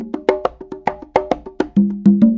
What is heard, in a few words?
090 bongos, 090 bpm; Bazillions of Bongos